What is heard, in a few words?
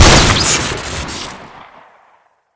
shoot crit, weapons; tf2; skullbuster